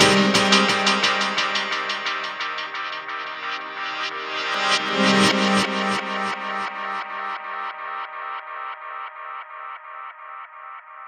D&B Keys; neuro

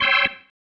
Stab, Organ, One Shots

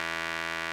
RMI; Sonic Reality - Vintage Timetraveler

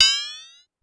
Triangle Up, Pitch Env; 300 Drum Machines; Pitch Env Various

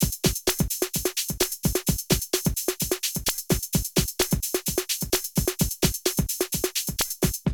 Drum loops, Rave & Hardcore

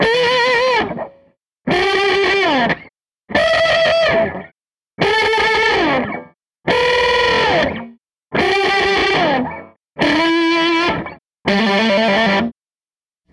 SG Guitar Loop

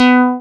SYN TBM C5, MC202; Sound & Vision - Gigapack I CD 2

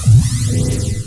scifi, SCIFI & SWEEPS; Techno Trance; FX & ATMO; Techno Trance Essentials

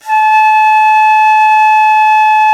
venu A4, India winds; World Combos; E-MU Producer Series Vol. 7 – Old World Instruments

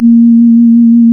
Synclav FM, WIND, A#; Big Fish Audio

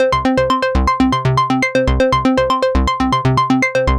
Synth

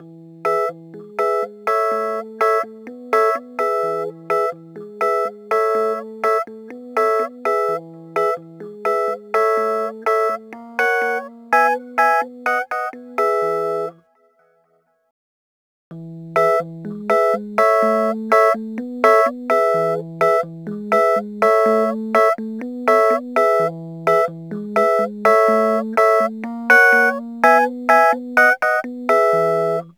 Rhodes Attacks; Ueberschall

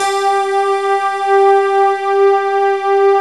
D50 Stak, Roland; 90s Sample CDs; Club-50 - Foundations Roland; D50 Syns

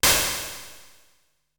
Noise Loop, Drum Machines Ensoniq SQ-R-Plus; 300 Drum Machines

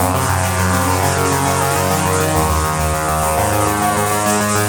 Seq Steam, Drum & Bass; Sonic Foundry (Sony Creative Software); Knutrix Drum & Bass

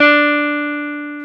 Jazz Guitar

